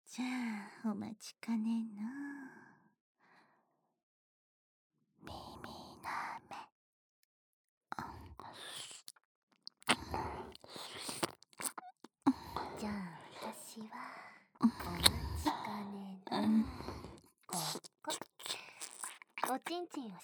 二人の耳舐め＆フェラ; お兄さんの精液ぜんぶちょうだい

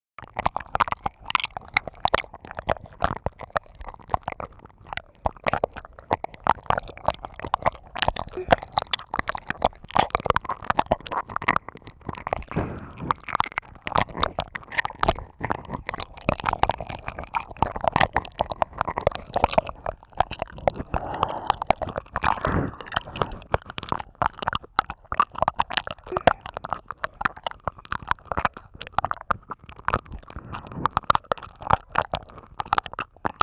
ねっとり両耳舐め

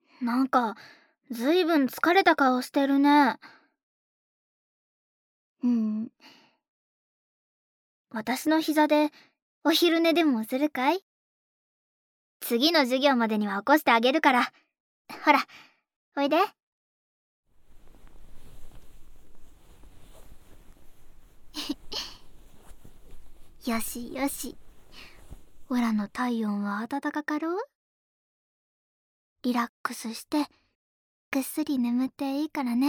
膝枕でお昼寝

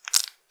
膣外射精

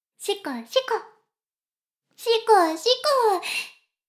しこしこ音声; 牧場戦腺; 少佐殿

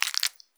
膣内射精

